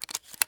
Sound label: mr133 shell in mag; Escape from Tarkov